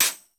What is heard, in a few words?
DANCE NOIZ; 90 sSampleCDs; Crypton - DrumBase5000